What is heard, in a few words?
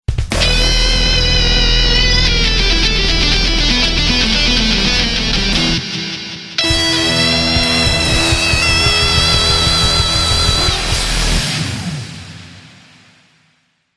rock, jingle